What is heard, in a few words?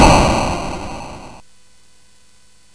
sid CSG8580R5, GAMES; Dragons of Flame